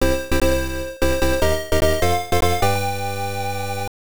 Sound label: win jingle; Super Medal Fighters; arcade